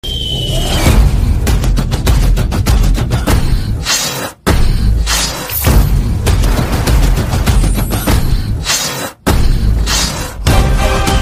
Trailer BGM Ringtone; kanguva; bobby

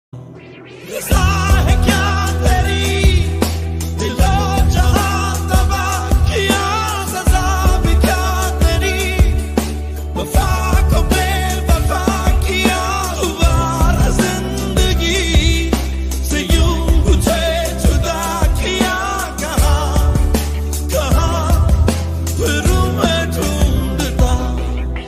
sad ringtone heart touching ringtone

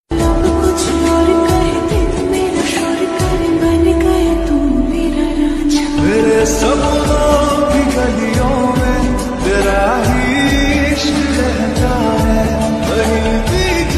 lofi ringtone lofi mix ringtone

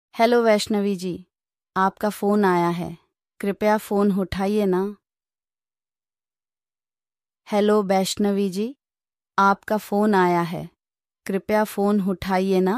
vaishnavi naam ki ringtone hindi

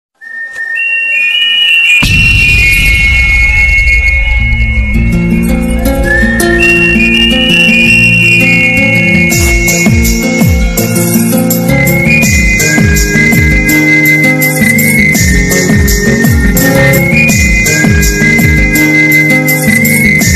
call ringtone love ringtone romantic ringtone

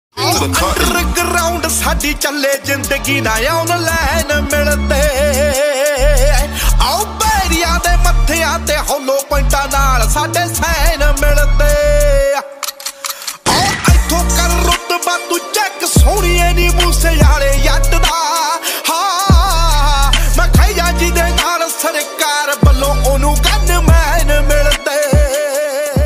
punjabi

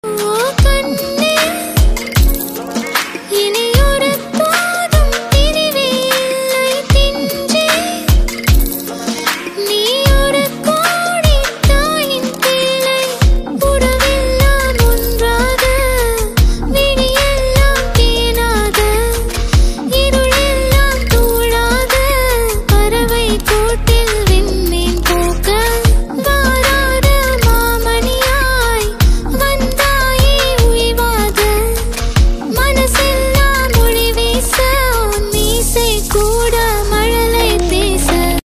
new tamil song ringtone

